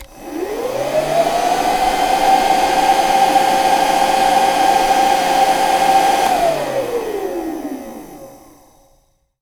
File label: cleaner, household; 1000fx